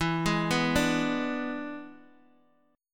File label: E 7th E down Guitar Open D